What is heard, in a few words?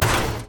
Drone